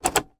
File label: van fan duct vent selector dial mercedes sprinter; transportation; sound effects; sound effects one